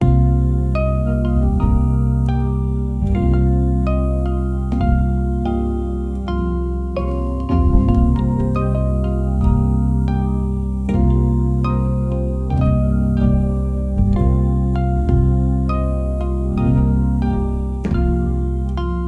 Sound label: playful mellow calm, melodic